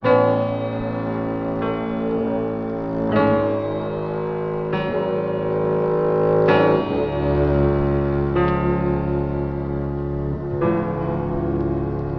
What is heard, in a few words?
piano final notes, sad loop; Loops